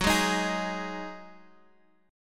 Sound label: Eb Minor Double Flat 5th